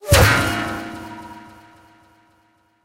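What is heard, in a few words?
kill scene ricochet, tanks; War Thunder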